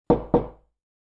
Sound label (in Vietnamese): tieng go cua go ngan 2 tieng